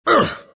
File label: pain; dota; night stalker